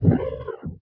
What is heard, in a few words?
chess illegal move; game; Nokia N900